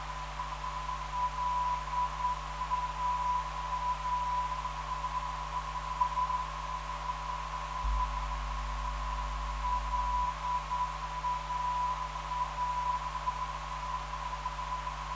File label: LAA 350kHz